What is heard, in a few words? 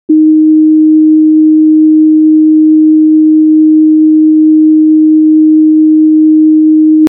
d sharp 311